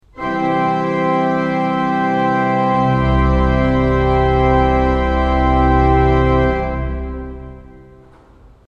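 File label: pleno + pedal, organ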